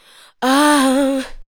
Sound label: ADLIBS 90; Ueberschall; Jam Box